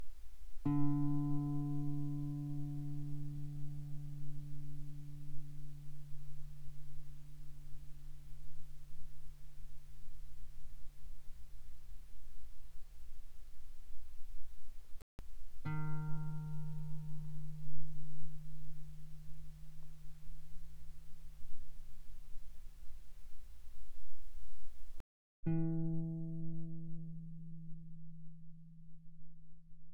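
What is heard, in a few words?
Guitar pianissimo sul D D3 B3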